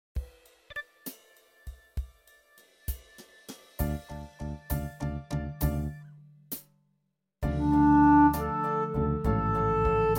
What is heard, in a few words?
Flee as a Bird Karaoke; CompletionSong